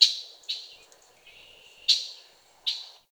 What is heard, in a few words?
Tree Birch Bird; Trees